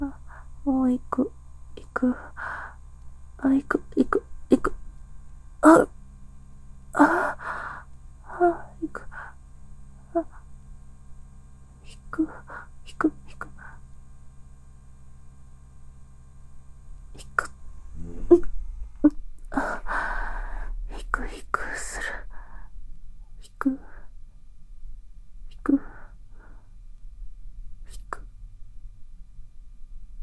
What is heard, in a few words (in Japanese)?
絶頂集; 綾川ちゆきがだいちゅき絶頂集